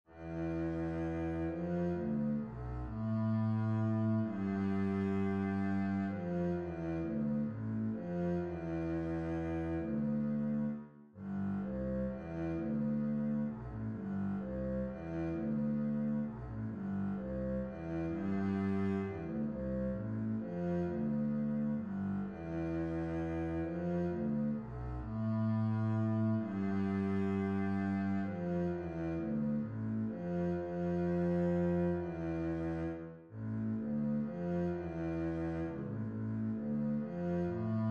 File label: concerto eminor bass, string bass